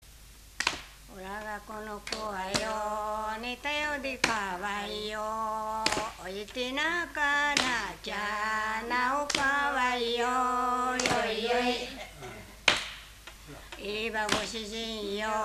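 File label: lullaby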